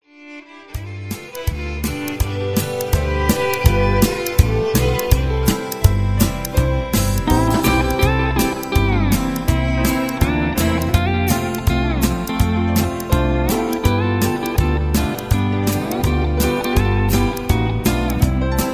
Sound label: Two Step Instrumental